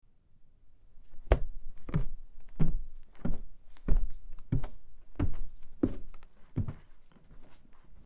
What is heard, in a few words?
Stair Footsteps; horror sfx vol 2